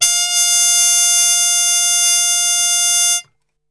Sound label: F 3, Brass; Best Service; Brass Super Section; TRP HARMUTE; 90 s Sample CDs